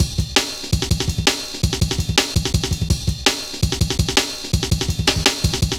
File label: Rollin' Ride, Jungle Warfare, Drum Loops, 165.5